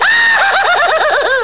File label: laughter wild